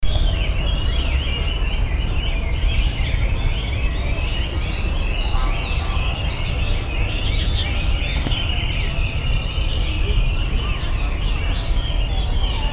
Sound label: warbler sakhalin leaf Phylloscopus borealoides